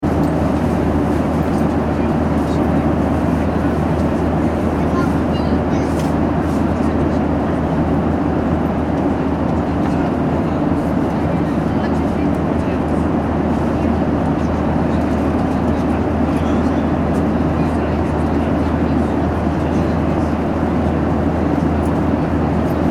transportation aircraft commercial boeing 737 800 interior, In-Flight Ambience; Vehicles; Airplanes; McKinneySound